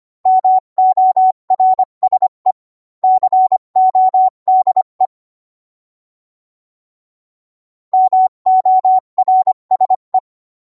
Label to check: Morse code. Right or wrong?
Right